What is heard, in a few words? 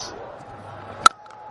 cricket ball shot sound effect